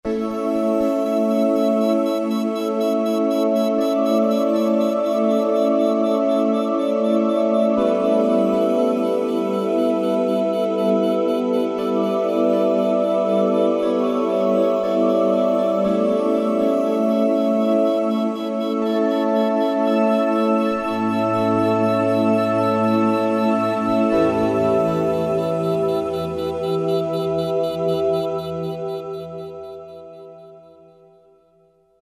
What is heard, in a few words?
Miracle Pad; Roland SRX-05 Supreme Dance